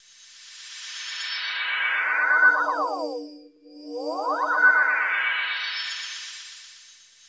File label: SAUCERS; Spectrasonic Distorted Reality 1